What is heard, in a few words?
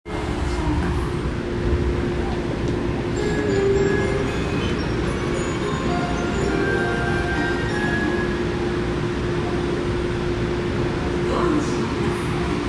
train sounds; 南北線六本木一丁目北